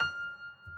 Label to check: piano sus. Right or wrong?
Right